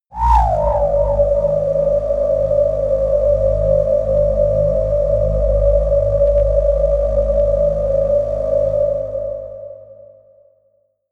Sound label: air drone whistle, drones; musikelemente